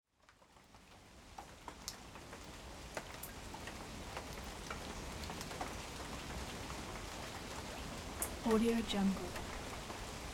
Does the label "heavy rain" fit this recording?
Yes